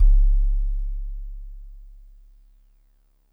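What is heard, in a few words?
DreamLike DX100 C sharp 0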